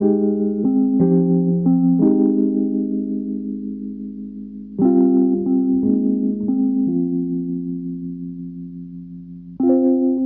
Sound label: rhodes